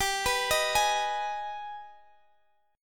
G sharp Minor Major 7th G

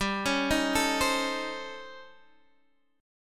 A 7th Sharp 9th Flat 5th

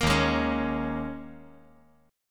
Eb 7th Suspended 4th; Eb7sus4; strummed; JGuitar

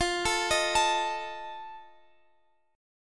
D sharp Suspended 2nd Flat 5th F

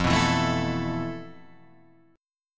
F Major 7th Flat 5th Guitar Open F, chord; strummed; FM7b5; 5,6,4,4,7,4; JGuitar

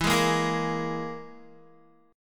E Major Flat 5th E down Guitar; strummed; 4F,4A,5C,5G,5C,6E

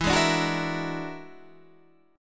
E 9th Flat 5th E down Guitar Half Step Down; E9b5

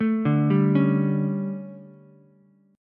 D Major 7th Suspended 4th, Ukulele; DM7sus4; arpeggiated; JGuitar

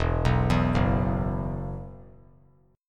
E Suspended 2nd, down; Esus2 arpeggiated

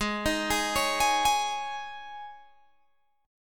Ab Suspended 4th chord, Guitar, Open G tuning; Absus4; arpeggiated; chordshape; 18,20,18,18,21,18

